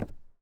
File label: FOOTSTEP - Metal Board Walk Barefoot Male; Barefoot on Metal; Timothy McHugh; GDC Game Audio Bundle